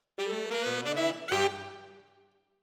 ORTF SCHOEPS, piano

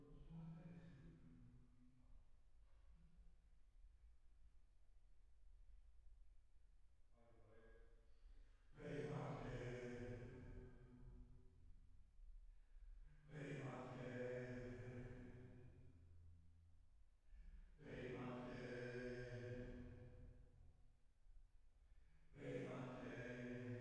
Choir

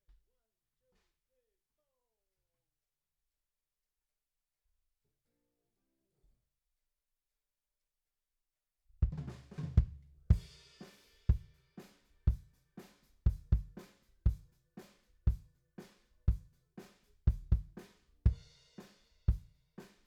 BD OUT

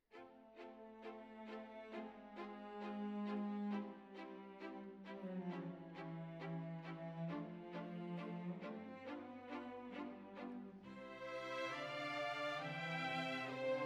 Violins Over

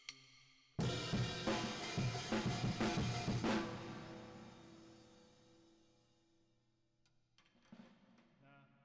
Drums Verb

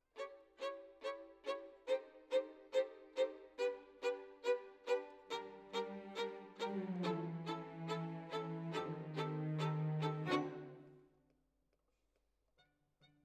Violin Solo

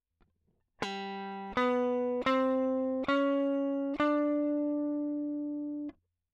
Guit Solo Line, RNR for piano

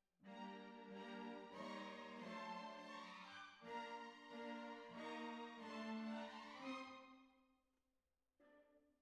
Cbasses

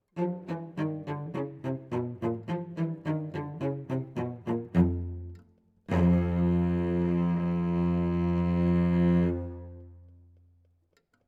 Cello Solo

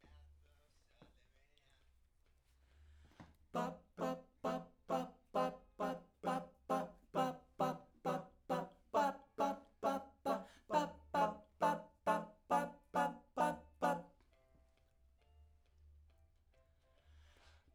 Backs SCHOEPS

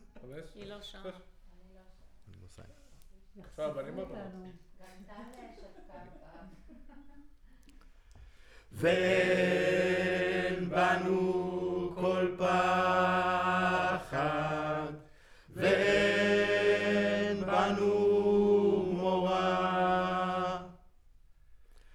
Center u47, Choir

